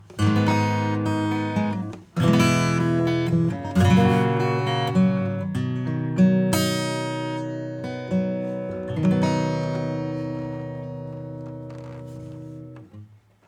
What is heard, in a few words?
Echorec bypass